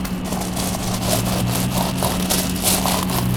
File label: Gravel Growing